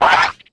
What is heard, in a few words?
Red Condor atk